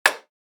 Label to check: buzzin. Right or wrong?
Wrong